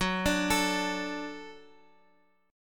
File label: Gb Minor Sharp 5th, Guitar, Open F; Gbm#5 arpeggiated; x,13,14,16,x,x